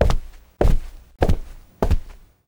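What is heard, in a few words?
high heeled shoe carpet footsteps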